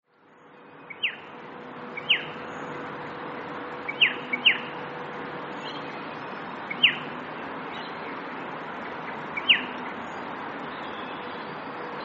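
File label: Striated Pardalote chip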